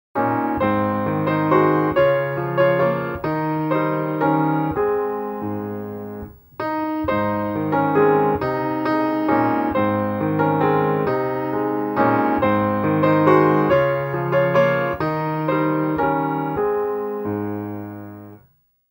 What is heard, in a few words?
happy people music only, childrens songbook portuguese